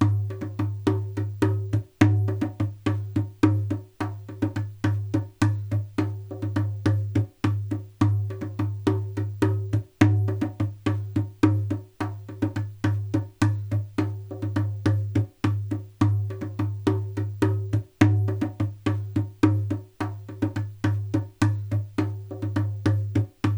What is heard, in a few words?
Clay Drum